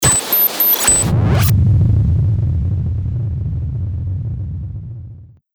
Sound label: Big Nailer, Breakers & Transitioners; Best Of N2O Library